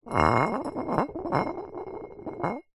foley glass bottle 1 ltr slide slightly gritty tiled floor